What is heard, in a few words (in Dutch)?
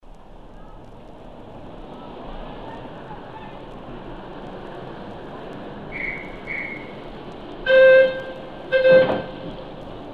tramgeluiden